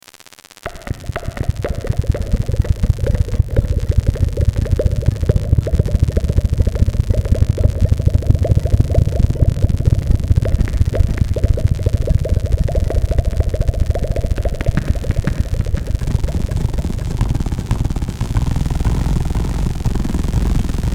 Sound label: noise